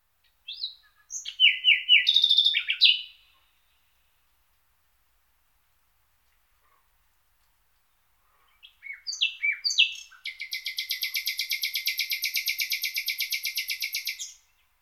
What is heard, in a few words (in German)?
Nachtigall Saar; Vogelwelt Felder Waelder